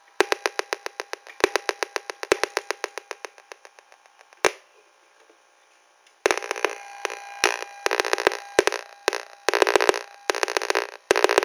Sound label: sound issue